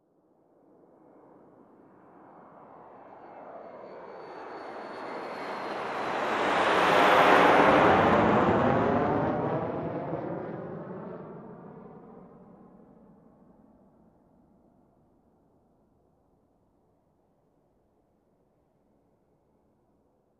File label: Aircraft Flying Over